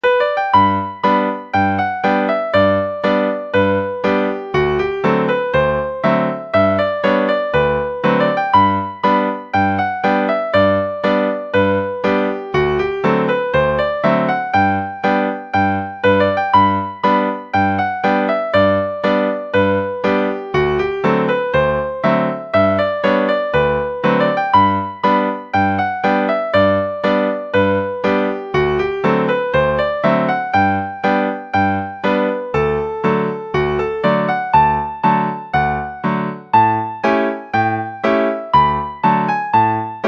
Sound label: polka; ble bell